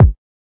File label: kik